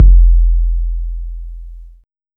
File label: KICK -R, Disco House; USB Soundscan vol.20 - Fresh Disco House I